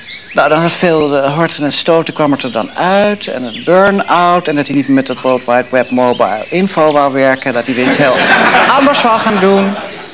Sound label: burnout